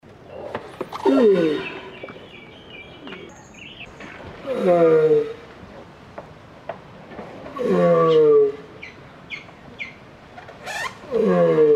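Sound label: sparivanie cherepahi, turtle mating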